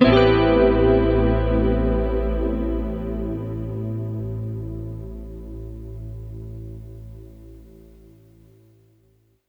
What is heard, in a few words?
pad a minor; Sonic Foundry (Sony Creative Software); Ugly Remnants VOL-2